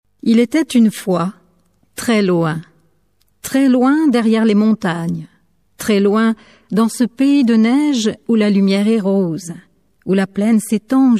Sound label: piano; pieces enfantines pour le piano; Hommes et pays lointains